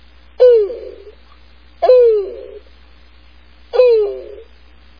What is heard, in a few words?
cuckoo